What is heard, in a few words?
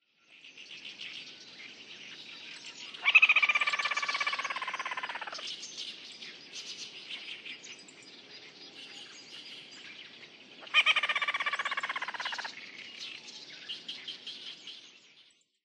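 Ruddy-breasted Crake; hikuina; watarase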